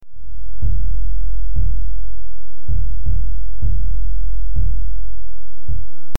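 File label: Loop bass drum 160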